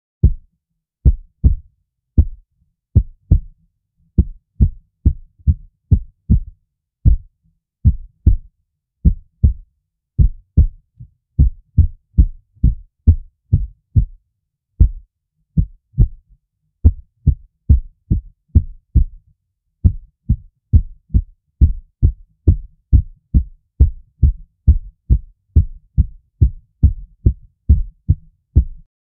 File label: real human heartbeat soun